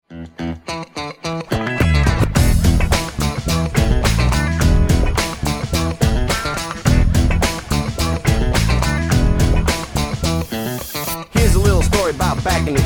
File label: playfunky